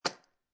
Jar With Beads Place On Table, Foley, Jar, Small Plastic Jar, Filled With Beads, Placed On Wooden Table; Set Down